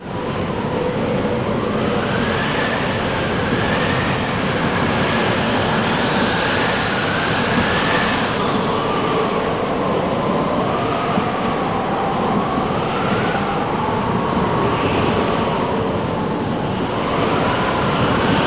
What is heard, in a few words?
storm; best service Blue Box